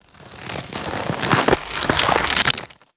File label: fast gritty dirt sweeps and hits